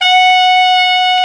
TENOR, Tenor Sax; Tenor Sax 1

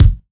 BD, Ragga & Dub Reggae; USB Soundscan vol.27; AKAI

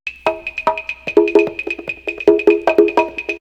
Percussion; AMG - Coldcut's Kleptomania VOL-1

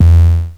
BUCHLA E3, Rare Synthesizer; Hollywood Edge; Giorgio Moroder Rare Synthesizer Collection

